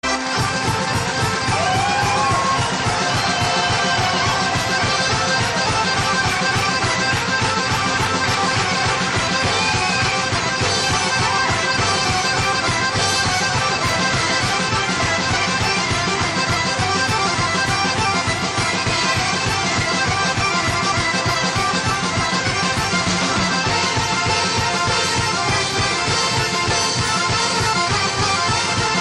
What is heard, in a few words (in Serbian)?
Orkestar Harmonika